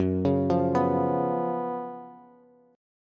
Gb Major 7th Suspended 4th Gb Bass; GbM7sus4; arpeggiated; 14,16,15,16; JGuitar

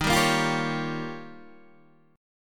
D sharp 9th D sharp, Guitar Standard; D#9 strummed; JGuitar

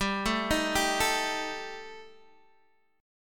G Suspended 2nd Sharp 5th, Guitar Open D

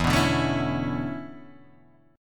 E 13th Suspended 4th; E134 Chord {0 2 0 2 2 0} strummed

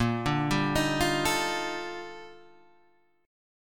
D sharp Major Sharp 11th, A sharp

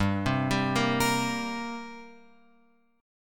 G Minor Double Flat 5th, G; Gmbb5 Chord {3 3 5 3 X 6} arpeggiated

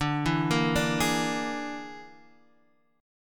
E 7th Flat 5th, D

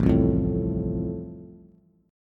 A Minor Sharp 5th A, Bass; strummed; JGuitar

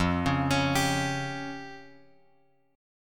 F Suspended 2nd Sharp 5th, chord; Fsus2#5 Chord {1 4 X X 2 3} arpeggiated

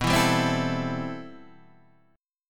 C Major 13th B

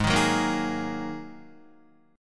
G sharp 7th Suspended 2nd, Guitar; strummed; chord

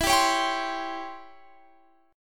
Db Minor Major 7th Flat 5th E

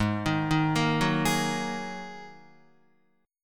Ab 7th Suspended 2nd Ab, Guitar; Ab7sus2 arpeggiated

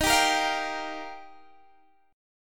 D sharp Major Add 9th, Guitar Half Step Down

